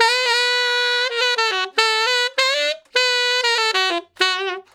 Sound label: Ten Sax Straight (B), R&B; Solos